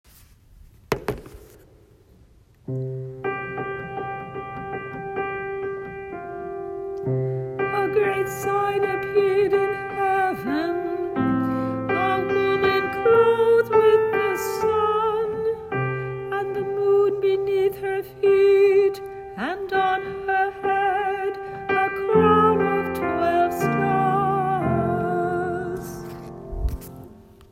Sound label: Entrance antiphon; Assumption